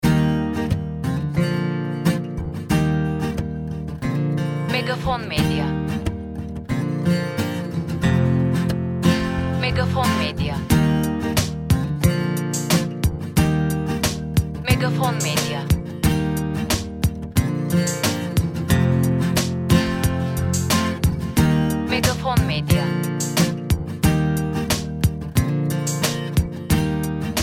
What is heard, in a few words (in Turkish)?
SANTRAL MÜZİK